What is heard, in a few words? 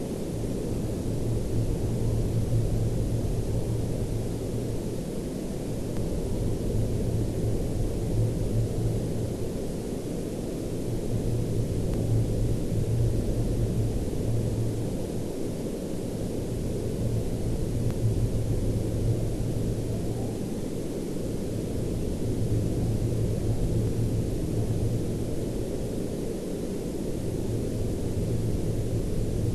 WFR audio emfisis